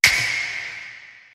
Snap Fingers

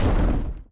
Mass Impact; Eden

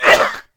Cough; Cougher; Avalon

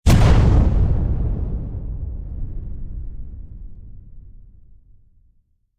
Fire Explosion; Awakened Light

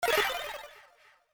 Dash; Eden

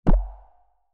Bubble; Eden

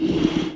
Hit

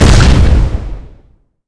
Slam; Paradise Lost; Sins Mod

